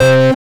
race start short; user interface; Call of Duty Modern Warfare

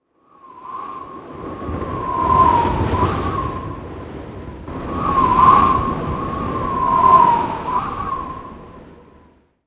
corner wind; Call of Duty Modern Warfare

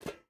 footstep rubber boots metal jump start; Rust

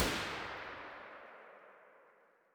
kedr loop close tail; Escape from Tarkov

